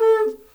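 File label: African horn, Mozambique horn; Spectrasonics; Heart of Africa 1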